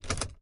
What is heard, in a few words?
Cruiser Twist Key; Lethal Company